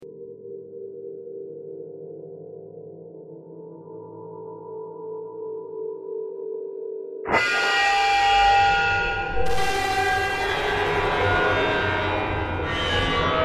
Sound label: flashback sequence